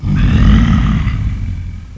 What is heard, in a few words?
GROWL; schweif